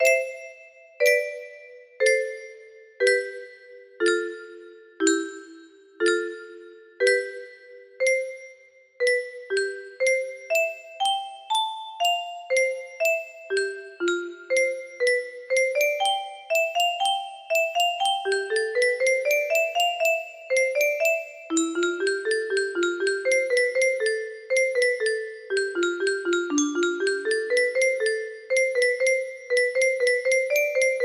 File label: music box melody